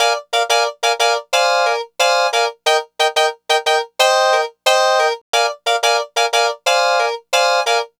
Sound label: Lounge Hrpsikrd-A#; Zero-G Kocktail Kollection; Construction Kitz (Lounge); Welk Lounge 090